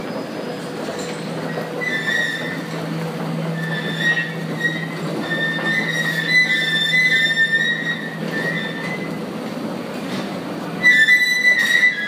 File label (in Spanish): Escalera Metro